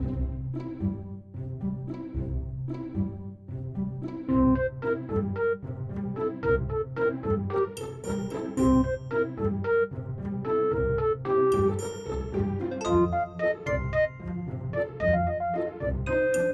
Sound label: bgm home